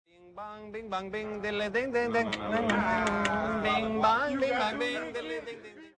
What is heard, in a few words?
bing bong bing bong bing